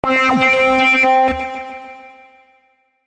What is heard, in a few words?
Alert Ray Gun; Samsung SGH-T839 Sidekick 4G